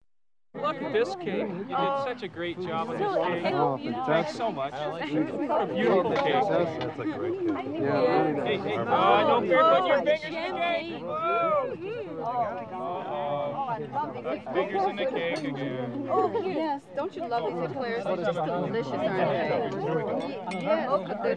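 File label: crowd at a wedding, dessert, glasses clinking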